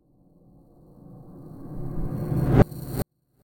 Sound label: Upright piano Fx Transition Tension; MatiasMacSD